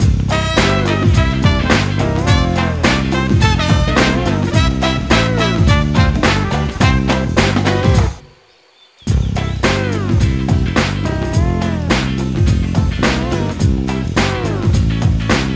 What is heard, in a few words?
Instrumental; Walt Disney's The Jungle Book Rhythm n Groove; PS1; PS2